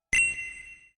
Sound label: button; pokemon